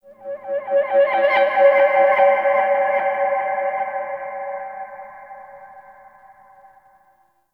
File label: Dark Wind Blow; Tau